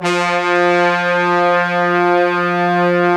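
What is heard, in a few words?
brass, Fat Pop Section; Roland L-CD702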